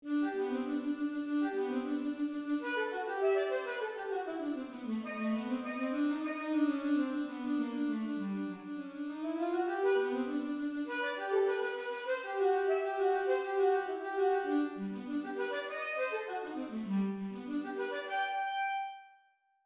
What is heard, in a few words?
Alto Saxophone